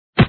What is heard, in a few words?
ball crash; magic heaven